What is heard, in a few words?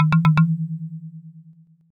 grave jingle; gbjam12